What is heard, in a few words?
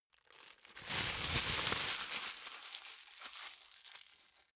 plastic bag rumble